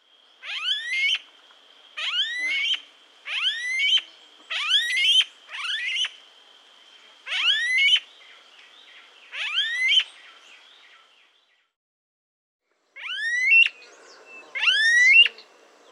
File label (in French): Ani à bec lisse